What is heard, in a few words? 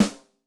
Roll Em, Yamaha DTXpress-II snare